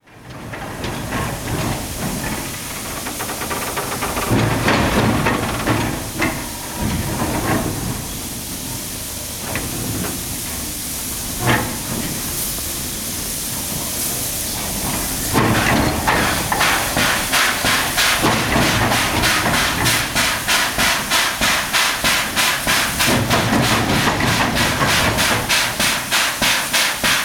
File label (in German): dampflok sound china